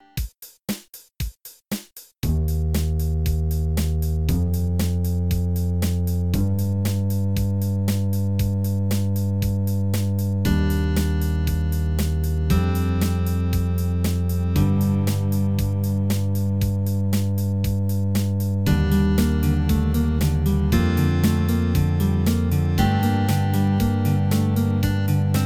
Game Over; Raptor; DOS

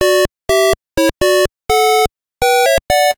Death; Vanguard

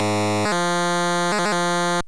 Vine Fanfare, A26; Pitfall!

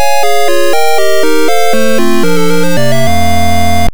Game Over, MSX2; Eggerland 2

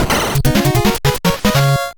Mission Start; Top Gun; NES

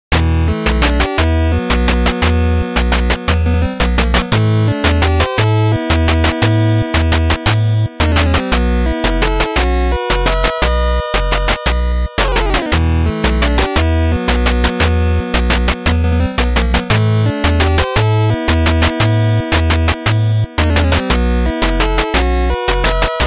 Congratulations; Turbo Racing; NES

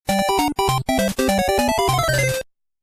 Music - Life Lost, NES; Mickey Mousecapade

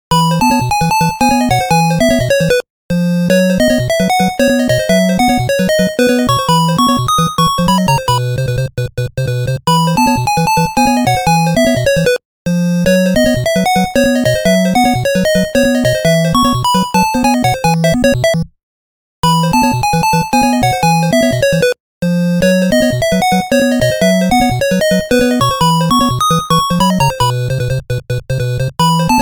Victory BGM; Family Circuit

